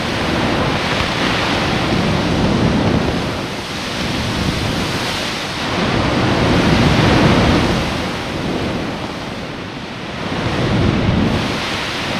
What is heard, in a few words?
wave loop, nature weather